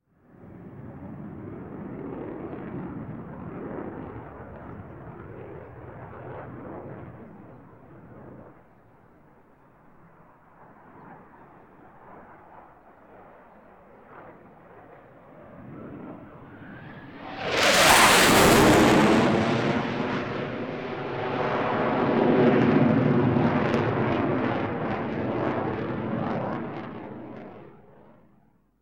FA-18 Fly Over; KMP Film Video Sound Effects

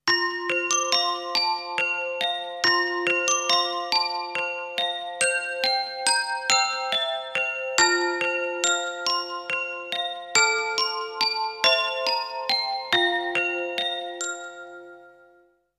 Christmas Jingles

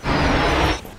speedup right; Pitch Black - A Detective's Demise

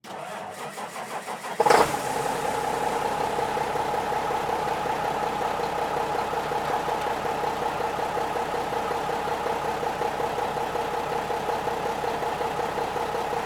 Semi Truck Mac; Blastwave

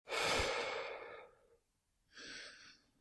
Breathe Slow heavy breath; Eden; RadwinIsland

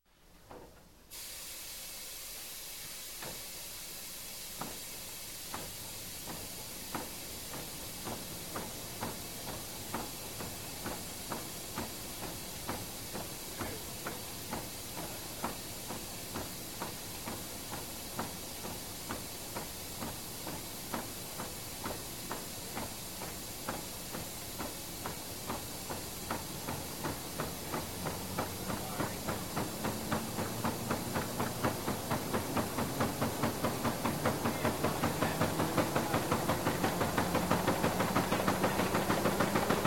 Newspaper Press Folder, Starts Up, Runs, voices; Factory; Sound Ideas 2000 Series

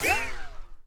hit shot bullet zip; Blind Drive

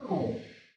Cutscene Sound; Pitch Black - A Detective's Demise

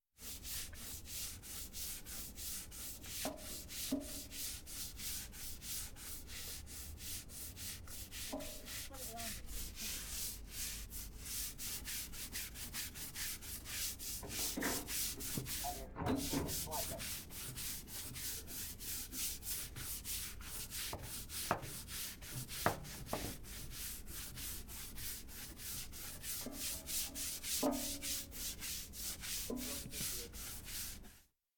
Scrubbing floor; KMP Film Video Sound Effects

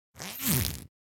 Zipper Open Close Up - Foley Cloth Movement; Hollywood Edge; sound designer's toolkit v2

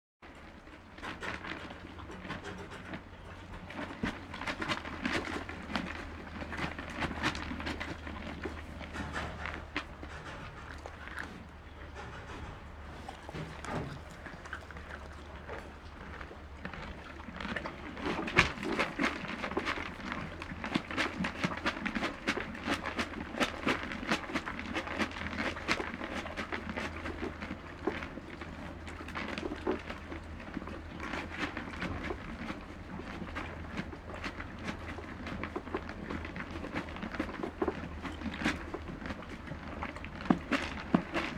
Animal effects snorts grunts farm ambience

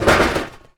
Book Drop Metal; SFX Kit; Foley - Misc

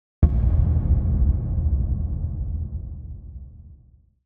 Impact Drum Dull Low Wooden Reverberant Hit

